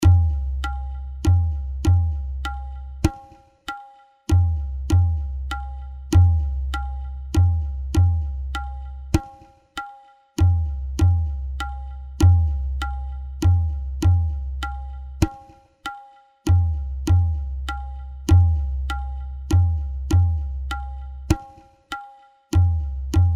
jhaptala, tala

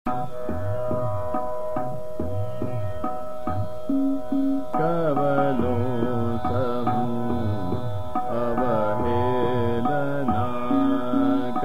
Chota Khyal - Trital, Shuddha Sarang; Kab Lon Sahoon Avhelna

